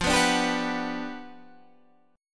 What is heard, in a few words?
G Major 7th, F; strummed; JGuitar